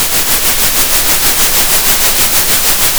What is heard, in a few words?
center, Sound of a train